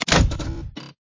Mechanicals smaller; Voices of the Void

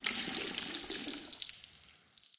Peeing spill

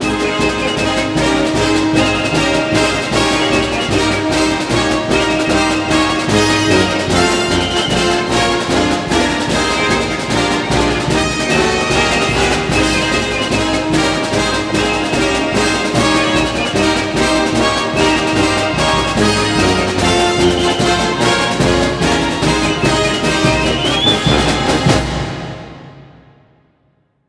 Broadcast Fight Song

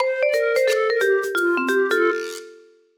Jingle Burned; Mario vs. Donkey Kong

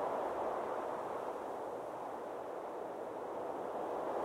Wind Speed medium

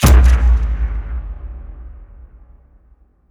menu arena start; Pix the Cat

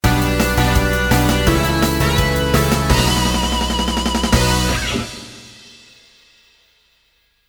stage complete; Valkyrius Prime

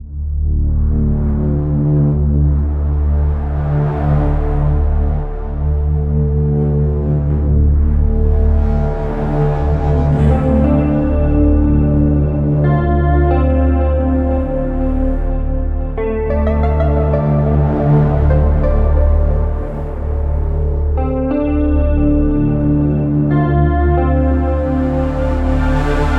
Sun Down - Ambient Loop; Cat Interstellar

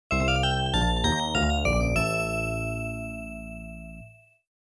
PATTERN CLEAR; QUARTH; Legend of Game Music 2 Platinum Box

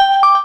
KEY Hold Finish

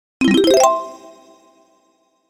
Find Watermelon Piece; Donkey Kong 64 HD; N64

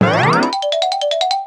prize reveal drum; Ringling Bros. and Barnum & Bailey; Wii

